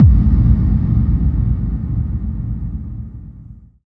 BD-FX, Dance Mega Drums; Best Service

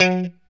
POP F#3, STRAT guitar; Spectrasonics; Hans Zimmer Guitars VOL-1; JC-STRAT POP

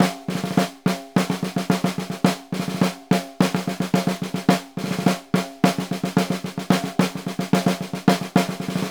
SNR WR PTN, Synclavier Percussion; ILIO; Synclavier Percussion 2; World & Orchestral

